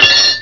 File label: metal